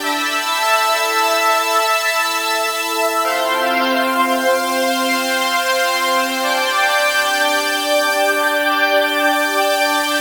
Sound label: Strings, Synth Sequences